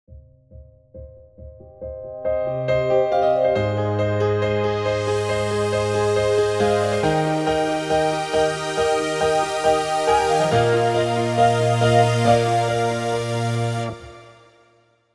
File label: Dream Grand